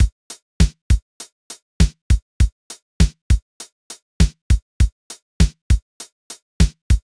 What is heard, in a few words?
Drum Machine